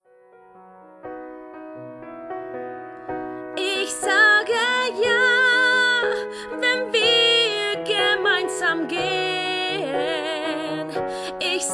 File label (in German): Gesang song